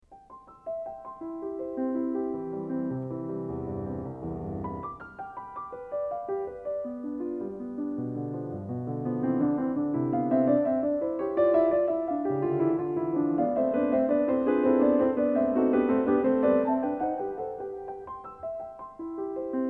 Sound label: 33 Piano Variations in C; Anton Diabelli